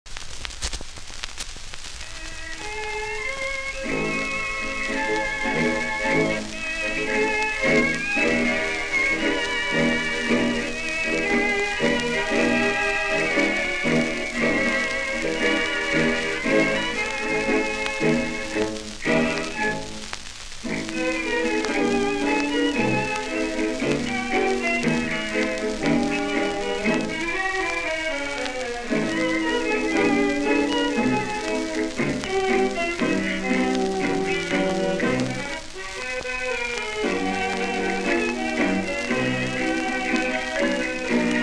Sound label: orchestra, vocal refrain, tango